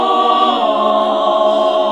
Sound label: SING, CLASSIC VOX; Best Service; Hallelujah